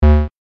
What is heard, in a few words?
error sound; vrchat